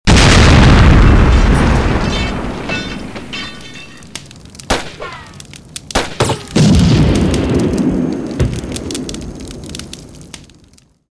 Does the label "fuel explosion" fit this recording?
Yes